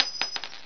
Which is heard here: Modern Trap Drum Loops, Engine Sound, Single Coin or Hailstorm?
Single Coin